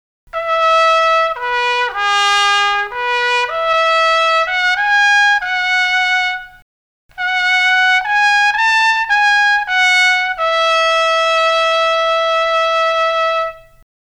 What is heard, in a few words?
Sax trmpt